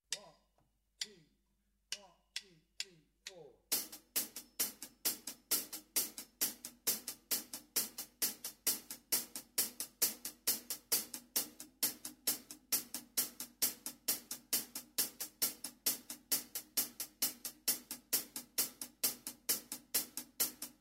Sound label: E INSTRU; MyVirtualBand; Rock